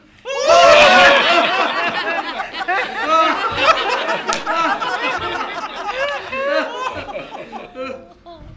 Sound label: Laughing Audience Laughtrack